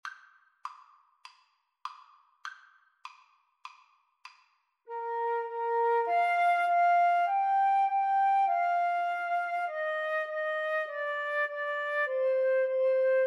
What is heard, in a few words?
twinkle twinkle, Flute-Clarinet Duet